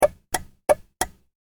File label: Car: Indicator On, Interior; Cars; Vehicles; Car Sound Effects; Stuart Duffield